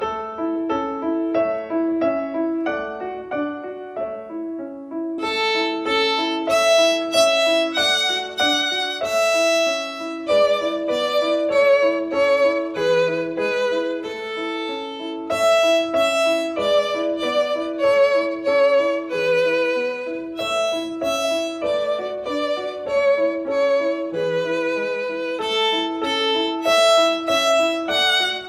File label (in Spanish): Twinkle Tema Normal